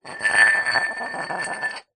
household glass coffee cup thick empty roll tiled floor; zapsplat